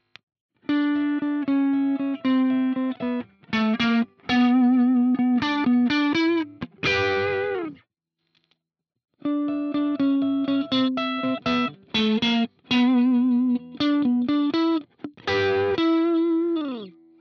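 Jackson Pro Plus Soloist SLA3 crunch Lick all positions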